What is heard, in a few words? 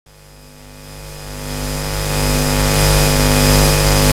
Ground, Industrial